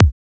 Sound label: LIFE KICK; Drums In Your FACE!